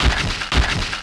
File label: Head Run